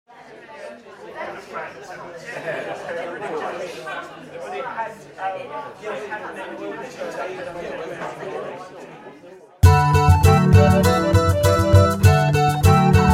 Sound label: Mandolin